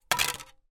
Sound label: household dvd discs drop on table